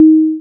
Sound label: tone